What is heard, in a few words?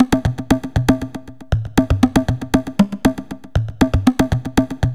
bongo; Music Store Sound Service; Einstein